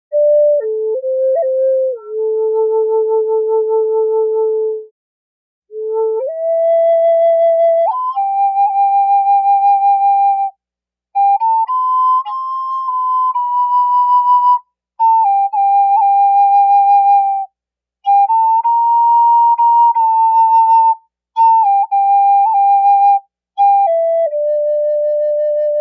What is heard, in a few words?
Medium A Eastern Cedar, flutes